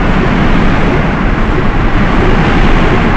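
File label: cross wind; pcdemo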